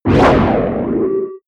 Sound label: whoosh distorted swish hissing